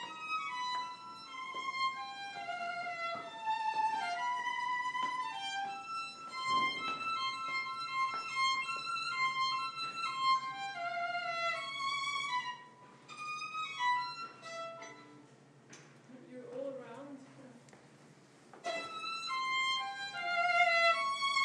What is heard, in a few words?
violinist warming up in the corridor; vilde frange; outside studio 80b broadcasting house